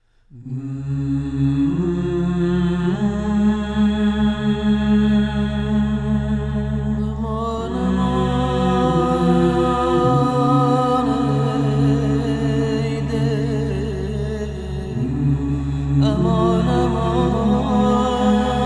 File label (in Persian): Vocal Gham